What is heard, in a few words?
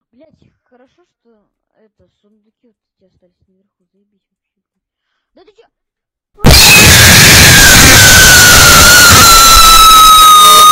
russian kid screaming earrape; minecraft